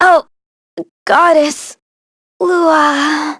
Vox Dead; Maria; kingsraid; voices heroes en

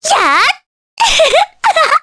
Laugh, jp; Cleo; kingsraid

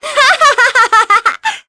Laugh; Sonia; kings raid; en

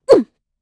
Vox Damage, kr; Hilda; kingsraid

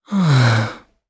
Vox Sigh; Evan; jp; kingsraid

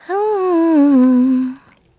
Vox Hum; kingsraid; en

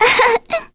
vox Laugh; Ophelia; kingsraid

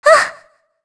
Vox Jump; kingsraid; voices heroes en

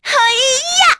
Vox Attack; Hanus; kingsraid; voices heroes

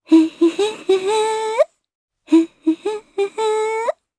Hum, jp; Yuria; Kings Raid

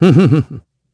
Vox Laugh; Siegfried; kingsraid

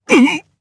Vox Damage jp; Phillop; kings raid

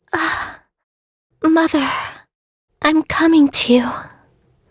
Vox Dead; kingsraid

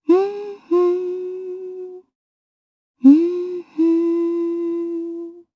Vox Hum jp; Kings Raid

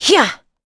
Vox Attack; kingsraid; en

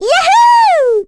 Vox Happy; en; voices; heroes; Lilia; kings raid

